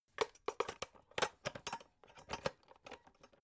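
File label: electric whisk patch handle metal, kitchen; haus-garten; kueche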